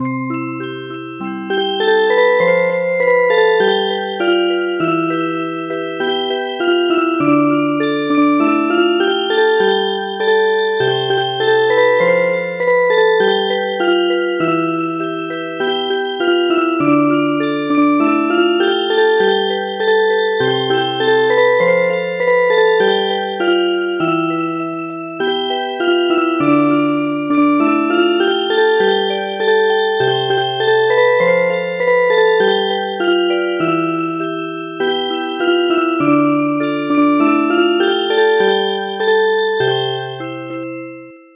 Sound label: Music Box; Good evening Kings